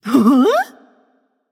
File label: confused light; smite; voicepack; hel